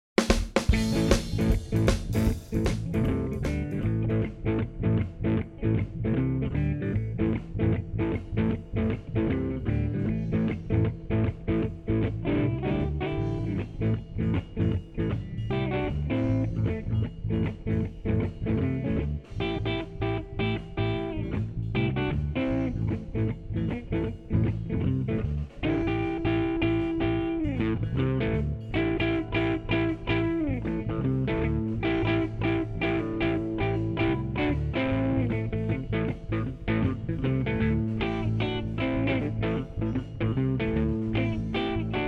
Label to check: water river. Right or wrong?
Wrong